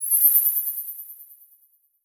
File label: Title Transition Tinkly; Production Element